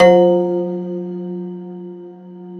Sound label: Rubbery Piano, prepared piano; Total Piano